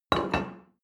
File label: plate place table medium; sport-freizeit; restaurant-cafe